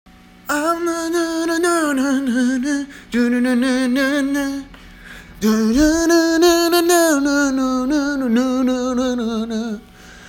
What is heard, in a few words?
Chorus; San Francisco